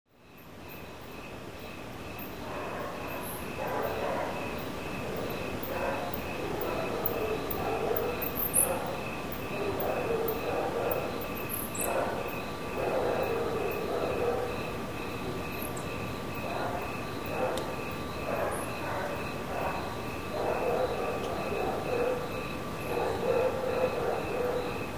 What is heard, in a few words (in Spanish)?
calles; iriarte y buchanan